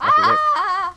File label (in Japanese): ああああああ！